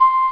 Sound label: Lead 3 (C5); 3D Ultra Pinball Demo; Pinball Demo Music